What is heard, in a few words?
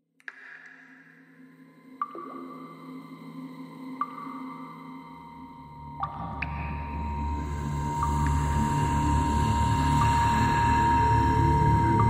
Dark Cave Ambience